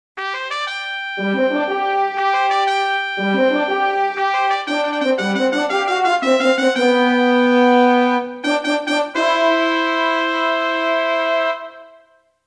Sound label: fanfale